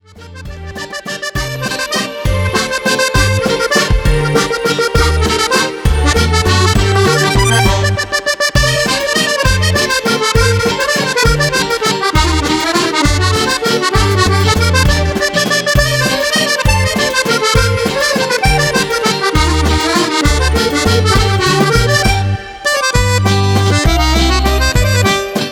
valse malicieuse

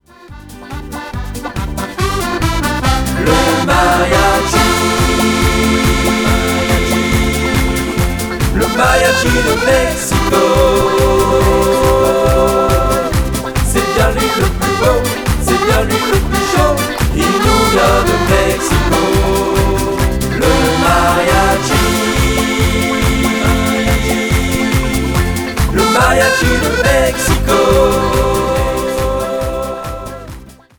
mariachi

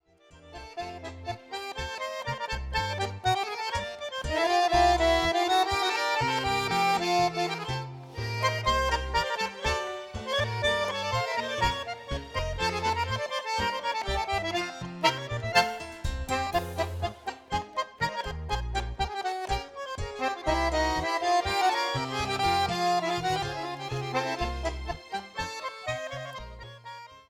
tango

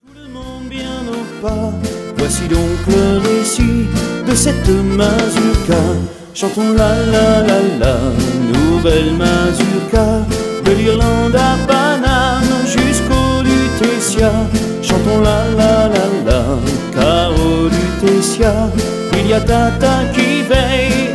mazurka

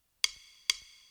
salsa magica

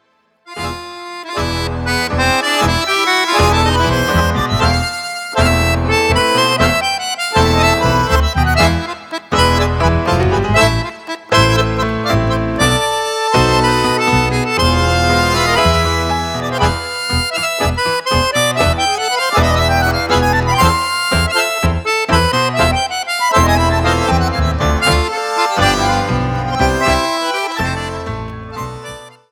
tango

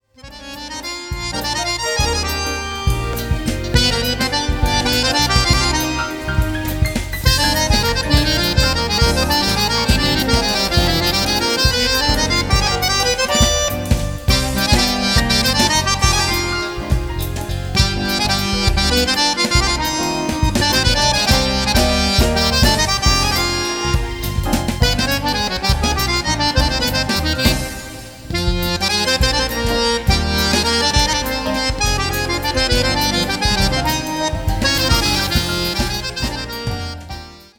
swing

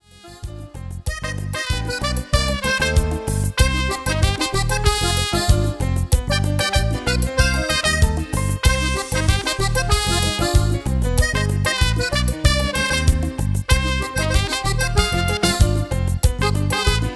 salsa

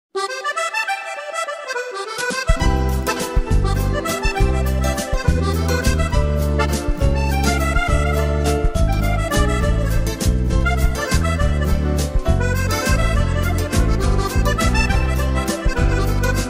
musette

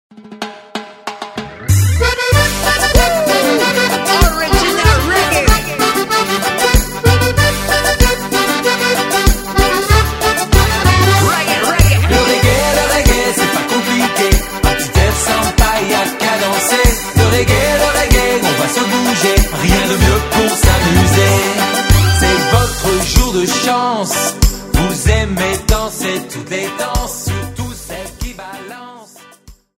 reggae

